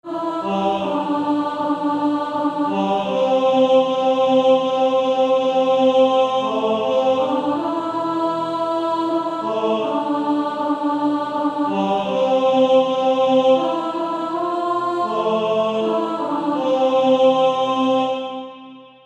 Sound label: Male Choir; Jesus Calls Us